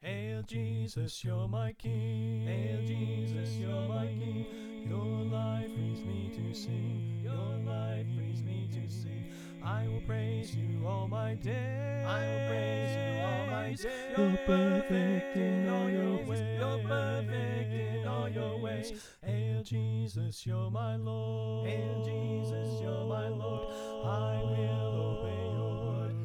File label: Victory Chant